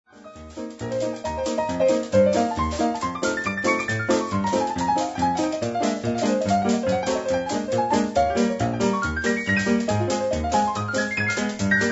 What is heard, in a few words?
bells